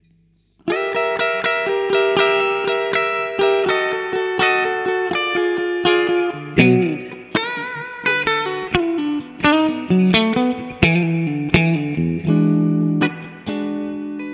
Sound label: ST62 middle bridge